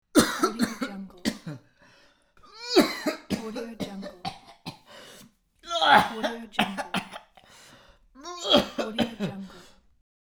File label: man coughing loudly